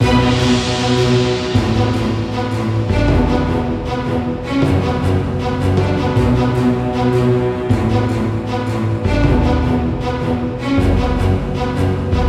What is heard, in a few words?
music clear; Castle Storm